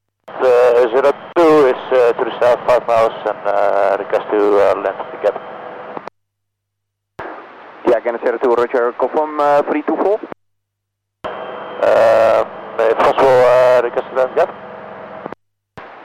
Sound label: landing; gannet02; leeuwarden